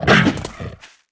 WAR BEAST ATTACK; Mob

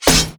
Spear Metal, Damage

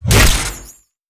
Plasmagun Metal, Damage

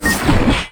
Attack; PACHI; Mob

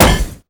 Staff Metal, Damage

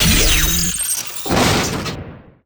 BLADE WAR DIE; HOBOBLADE; Mob

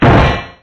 Gun Metal, Damage

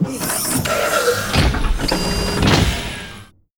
SIEGE READY; ACCRETIA

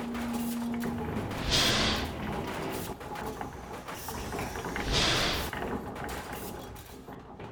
Piston, ambience

